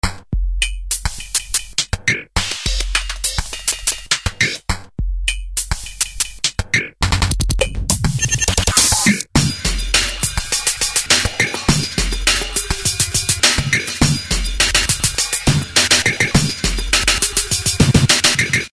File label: GLITCH BEAT; HTC; Ring Tone; Stereo; E-Club Ring Tone Pack 2